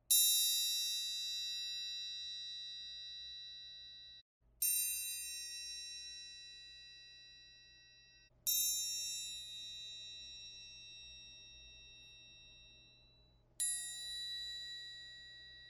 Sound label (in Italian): Triangolo Diversi Colpi